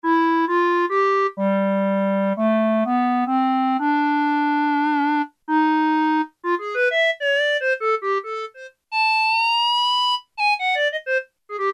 Clarinet, Kawai K1m; Factory patches - I banks

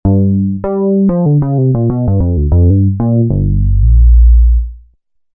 Mellow Bass, Roland V-Synth; Factory Patches

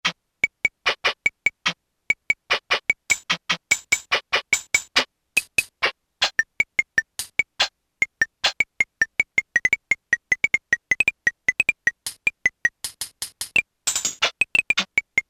PERCUSSION, Casio Rapman; Tones

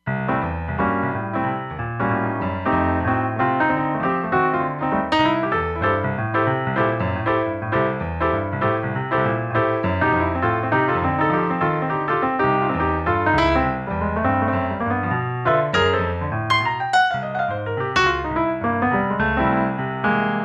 Punch Piano, Roland Fantom XR

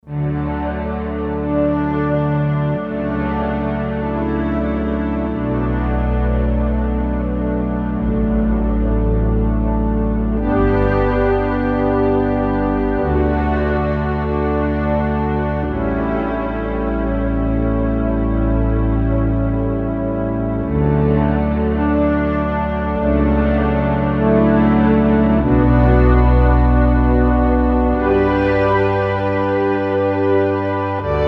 Silk String, Korg

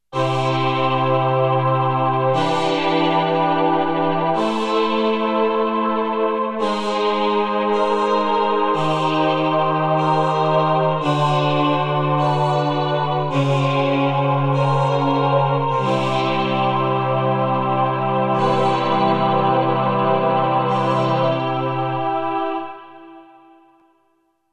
Halo Pad

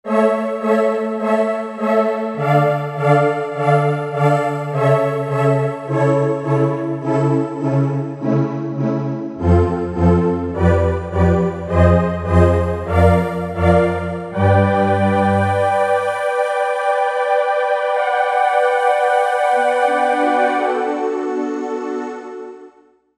Four Singers, synth

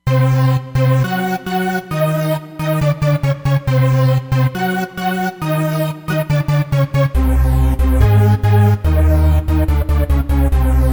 Hoovercraft, Roland Fantom XR

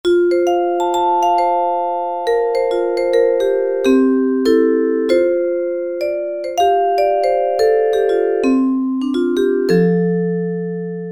Hard Vibes, E-mu Proteus VX

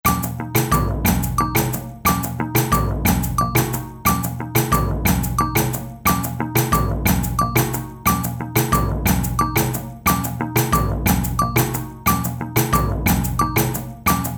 Polyrhythm

